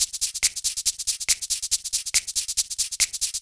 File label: Loops 140 BPM Hihat Percussion Loops